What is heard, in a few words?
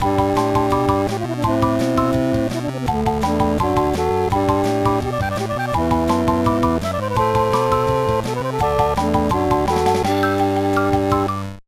Continue?; crazy chase; gba